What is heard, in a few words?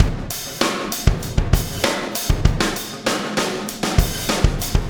Wormhole Beat, Processed Drumkits